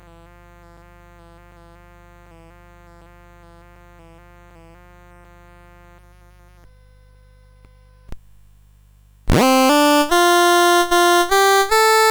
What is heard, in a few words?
Lock Lomand, CSG8580R5